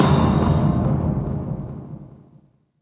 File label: FX Impact; Vengeance Samples